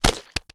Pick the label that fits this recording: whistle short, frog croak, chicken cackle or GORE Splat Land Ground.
GORE Splat Land Ground